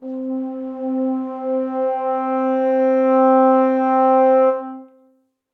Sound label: intimate, brass quartet; MPE; Ableton; Factory Packs; Spitfire Audio